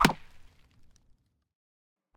arrow wood, attack impact

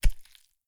flesh stab, attack impact

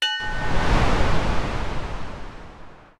alarm warship; interface

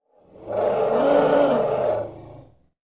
elephant attack; fauna

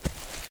fs grass, singlesteps

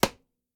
arrow, attack impact

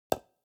construction wood

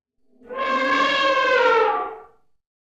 elephant death; fauna

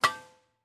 mine metal

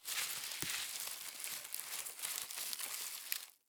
leaves, foraging

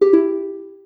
chat alert

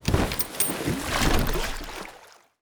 gather fish; ships siege

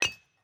mine stone

